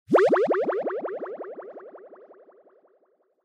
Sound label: Motion Pop